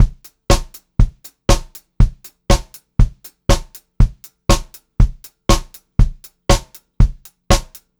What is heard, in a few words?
120 pop beat, Pop-Rock Drumloops